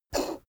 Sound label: poker chip flick slide table